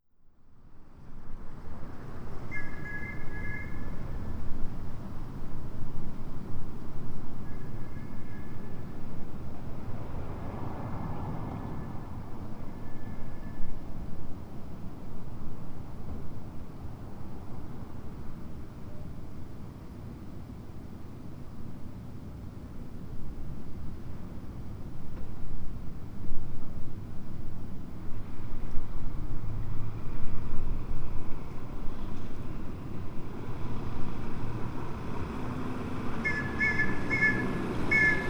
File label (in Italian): giovedi notte fischio sicurezza, petropolis